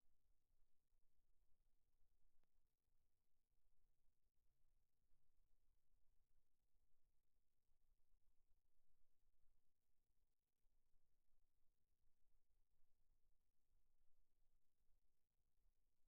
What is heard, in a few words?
Snare Bottom, 94bpm